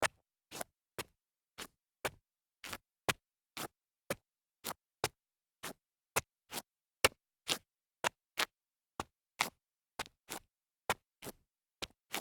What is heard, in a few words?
sneaker toe slides concrete, foley